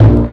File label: plucked bass; Notes